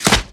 Ranged Physical Medium; Kilta

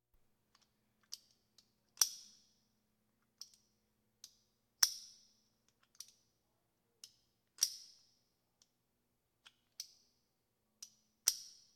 Police, Fire engines

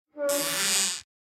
Door - Wood Rub Creak Medium; Hollywood Edge; sound designer's toolkit v2

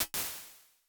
480 Vocal Plate, Impulse Responses; Reverbs; PastToFutureReverbs; CLA; IRs

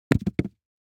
DICE on Felt, Throw and Roll, Foam, 2 Two Dice; Samples; ArticulatedSounds; DICE Sfx Party (Extended)

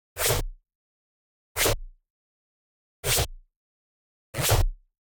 Generic Slide; boom library; The Interface

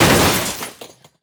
Street Ball Glass Shatter; SFX Kit

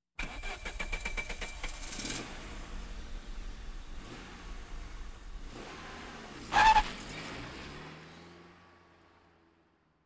Automobiles various

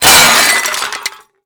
Junk Crash; Crashes; 1001 Sound Effects

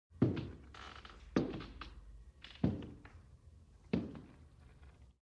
Old Wood Steps

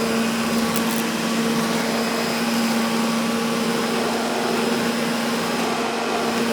Vacuum Cleaner; Appliances; 1001 Sound Effects

